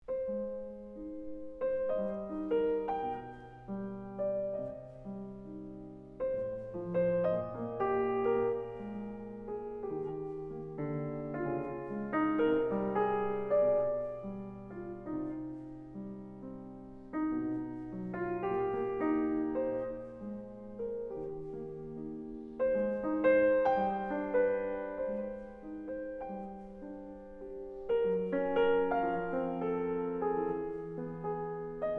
Silvestrov Two Waltzes Waltz 1

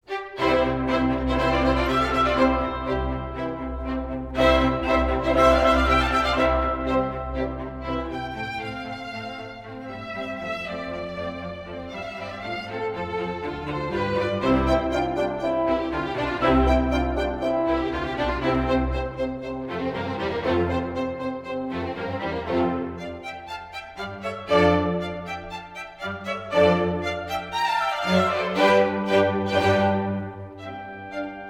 Cello; Myslivecek

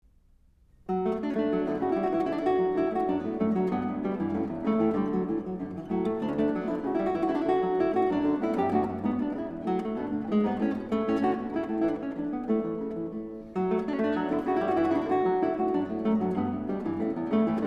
French Suite Bourree

